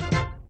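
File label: fanfare end of ball, pinball; sfx; WILLIAMS; INDIANA JONES; Indiana-Jones-Original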